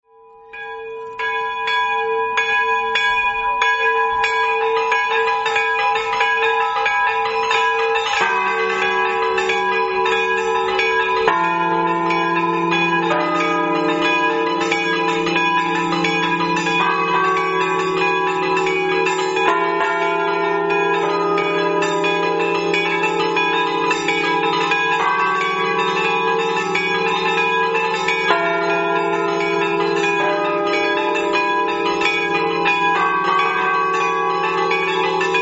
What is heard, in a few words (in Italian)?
campane